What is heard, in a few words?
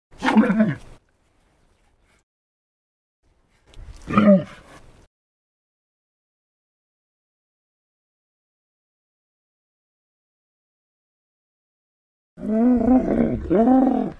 camels; anno-1404